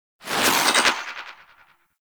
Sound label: Protea Fan Shrapnel Projectile By; Warframe